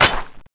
clip out; weapons; buff ng7

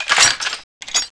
chain mg clip on, weapons

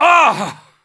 human die; wolfteam

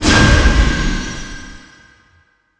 halo gun fx, weapons

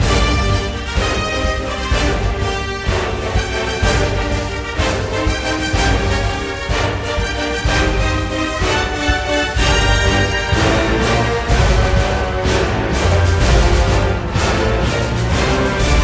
pirate; ze potc fabi

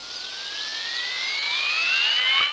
laser guide shoot, weapons

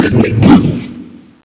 boomer skill; zombi

